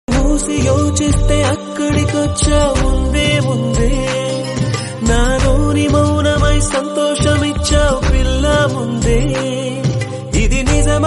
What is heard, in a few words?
tamil love bgm ringtone south love bgm ringtone